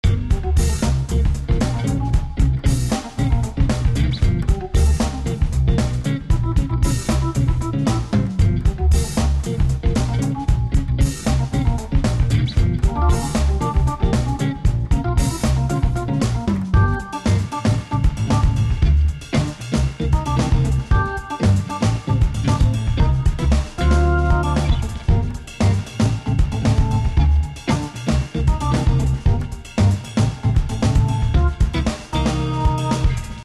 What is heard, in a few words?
Cinematic